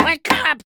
tree wakeup; crocs

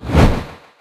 cloud climb

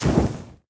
tower hatchery attack; sfx

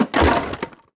heroes demolition Man destroy; torres

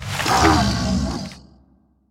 howling tree; sfx

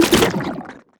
enemy vile Spawner spawn cast

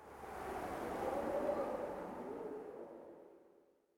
terrain Ambient wind

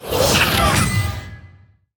wukong enemy doombringer stun

